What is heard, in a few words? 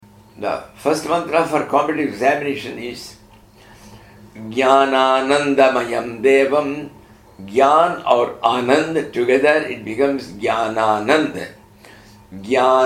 HAYAGREEVA MANTRA